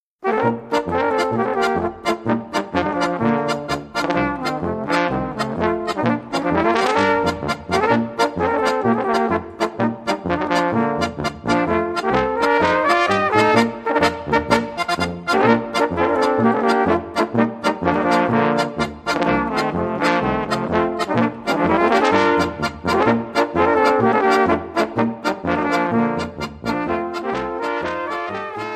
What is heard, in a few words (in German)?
polka